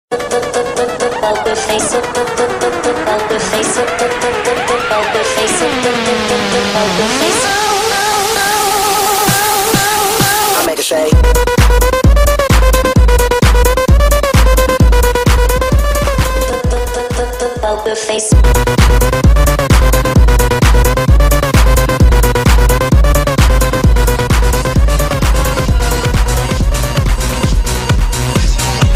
Electrohouse Mix

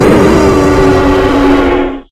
jumpscare; Fnac

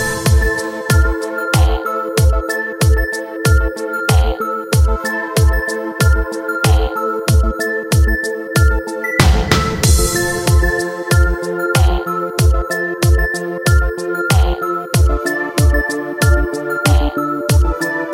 3d Stylish Tone

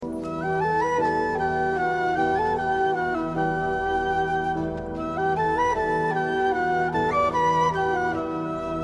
Cute Flute

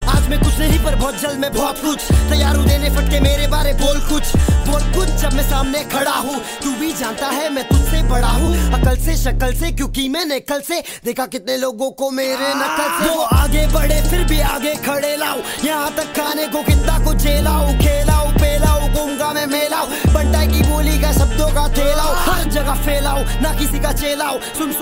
Rapper

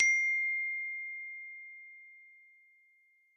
Note ios

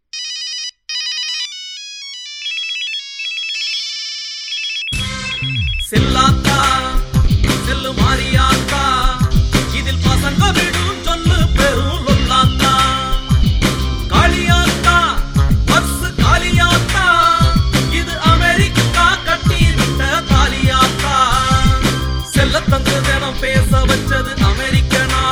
Tamil Funny Remix

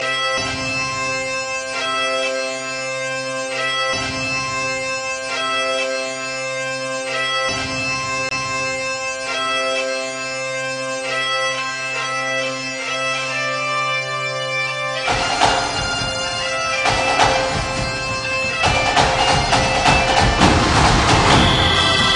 World Cup Music

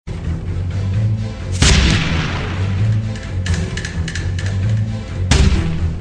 Double Barrel

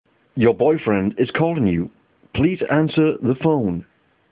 boyfriend calling

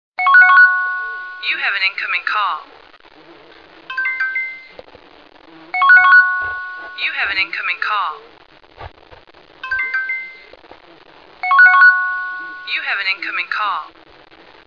Incoming Call

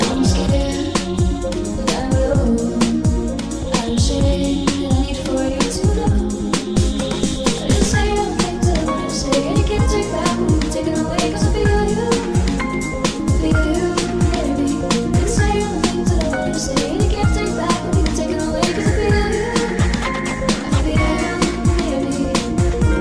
Damaged 808 Remix

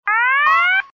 Alert Tune

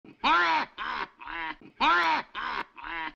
Penguin Quack

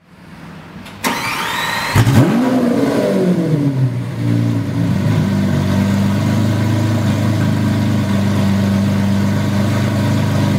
Lambo Aventador

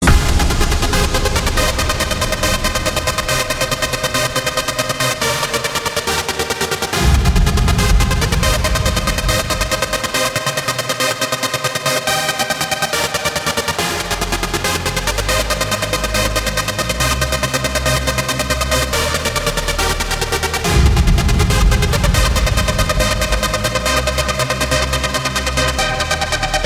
Trance

